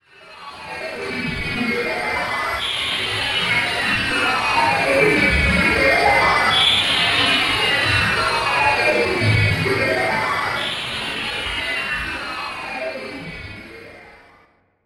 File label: Production Element Title Transition Data Transmission